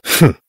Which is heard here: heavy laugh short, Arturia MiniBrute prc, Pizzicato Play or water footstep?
heavy laugh short